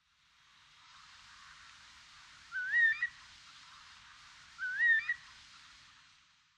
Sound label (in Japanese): horoku, sanbanse, koe